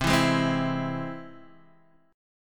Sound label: C Augmented, chord; strummed; 8,7,6,5,5,x; Standard; JGuitar